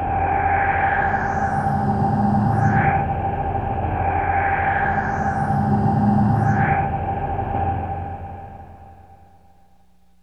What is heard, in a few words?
White Noise